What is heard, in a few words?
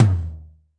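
tom, acoustic set; drum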